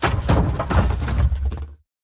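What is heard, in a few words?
destruction of old wooden barn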